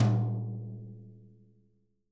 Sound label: BRUSHES, Living Drums